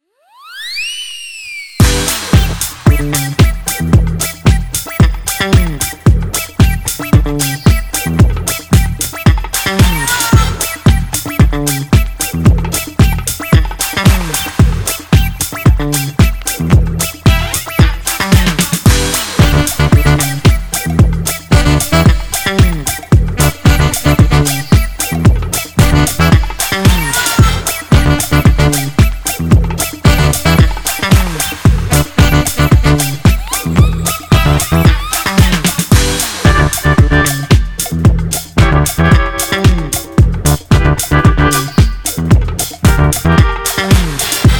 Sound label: Instrumental